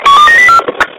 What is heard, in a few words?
police call